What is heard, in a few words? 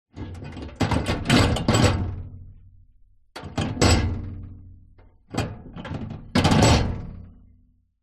household microwave oven glass turntable place inside movements